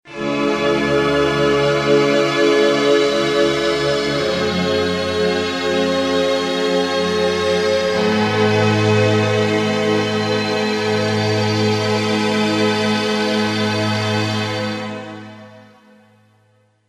ROLAND JP-6

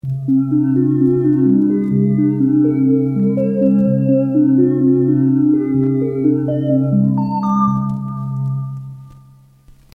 Soft Night, dx7

